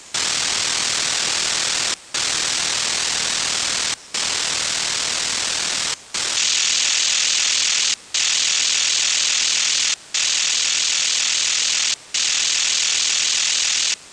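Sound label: unknown signal; neizvestnij signal na 12783 khz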